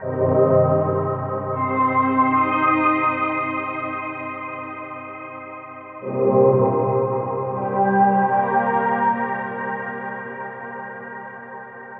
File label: SYNTHPAD AMBNT 160 C; eJay; Ambient; Sphere